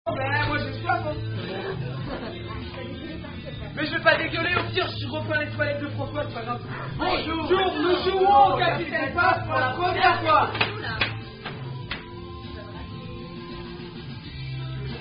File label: French Version Of Are You A Cardinal Drinking Game; Capitaine Paf 2